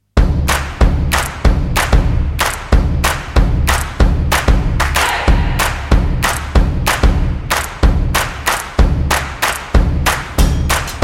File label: Drum drum